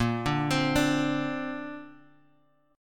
A sharp Major Add 9th, Guitar Open G

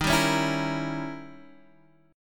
D sharp 7th Flat 9th Guitar Half Step Down; D#7b9

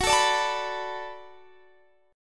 B Minor 7th F sharp, chord

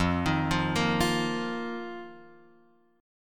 F 7th Suspended 4th F down Guitar Open F; arpeggiated; JGuitar

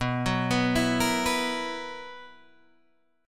B Major 7th Suspended 4th B down Guitar Open G

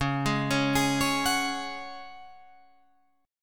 C sharp Suspended 4th C sharp down Guitar Drop D

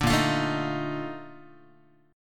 Bb Major 11th Guitar, chord; strummed; JGuitar

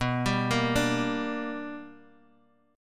B-Minor Major 7th Sharp 5th B down; BmM7#5 arpeggiated; JGuitar

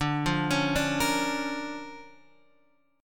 D Minor Major 7th Sharp 5th, D, Guitar, Standard; x,5,3,6,3,6; DmM7#5 arpeggiated; JGuitar

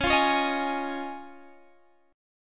C sharp Major Add 9th; C#add9; strummed

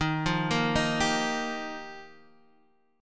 G Minor 7th Sharp 5th, Eb

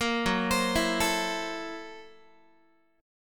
F sharp 7th Sharp 9th Flat 5th Guitar, chord; 13,14,15,14,13,x; 4A,4E,5A,5D,5Gsharp,6Csharp; arpeggiated

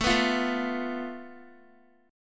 A Suspended 2nd Sharp 5th, down, Guitar; Asus2#5; strummed; 4F,4A,5C,5G,5C,6E; JGuitar

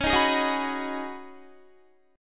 Db 7th Suspended 2nd Suspended 4th, Guitar; Db7sus2sus4 strummed

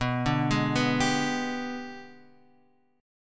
Ab Minor Major 7th Flat 5th

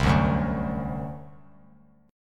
C Suspended 2nd Flat 5th C down; Csus2b5 strummed

